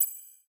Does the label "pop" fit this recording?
Yes